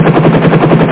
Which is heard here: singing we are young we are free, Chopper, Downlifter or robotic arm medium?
Chopper